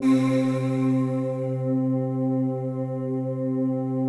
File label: PAD, ATMO PADS; Best Service; XX Large